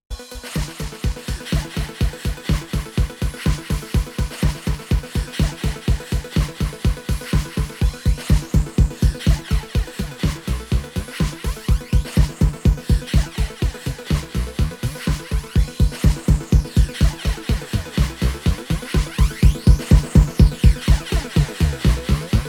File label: FlangeChain